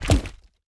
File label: ui construction plan place